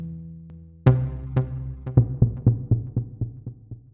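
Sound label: 122 BPM Analog Melodies A major G minor Tonic C; mmb studio soundtrack essentials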